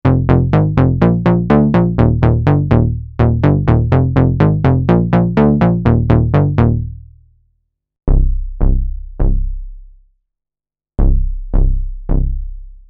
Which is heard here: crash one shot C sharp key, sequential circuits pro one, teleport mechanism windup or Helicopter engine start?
sequential circuits pro one